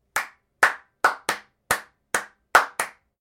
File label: maj scale rhythm